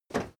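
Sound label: step crowd run quiet single right, footsteps; schritte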